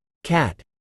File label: cat